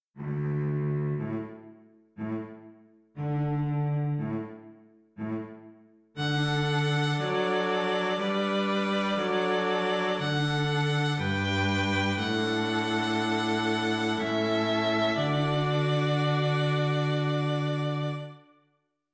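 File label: String Quartet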